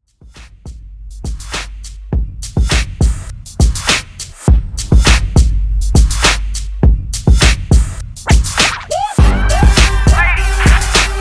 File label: 95BpM; Redrum